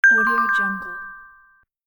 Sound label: complete